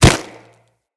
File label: Kubrow Step Snow; Footsteps